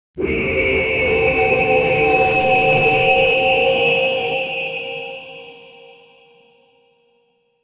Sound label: high quality fantasy spel; spells